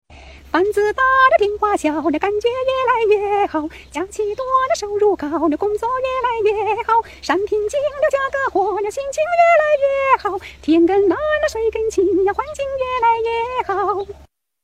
Chinese grandma sings

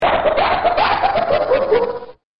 laugh; sonic

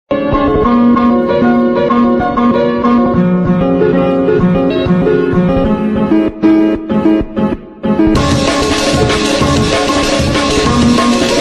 breakcore; rainworld; meme

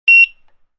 barcode scanner beep; made with Voicemod

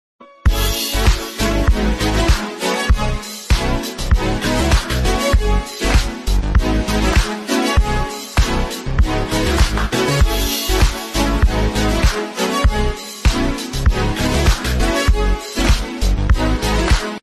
blue shirt guy dancing sound effect

